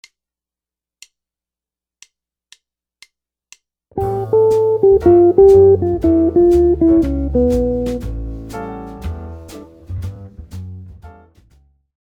melodic minor licks, guitar

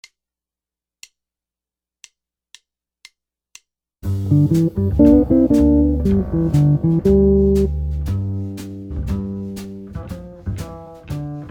licks, jazz guitar

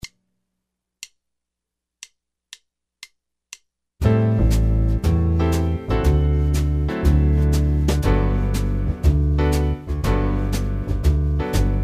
backing track g maj

